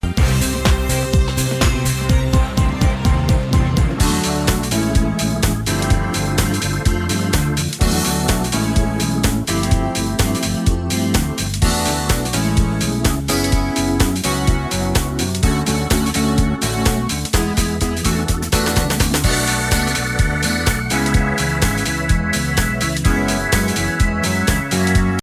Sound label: DISCO